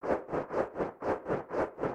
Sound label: Flapping Wings; creatures organic